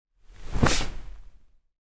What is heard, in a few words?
office chair padded person sit down